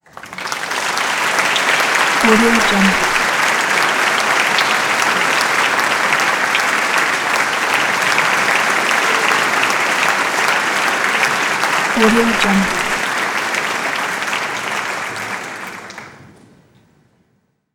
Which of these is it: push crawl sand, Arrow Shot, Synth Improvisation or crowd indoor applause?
crowd indoor applause